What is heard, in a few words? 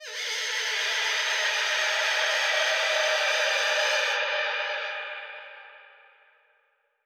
Echo Proc B, alarm siren samples, 120bpm; musicradar